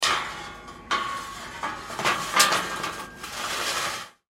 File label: Band Saw Object On, Band Saw Place Metal Sheet On Base Object; Saws; Industry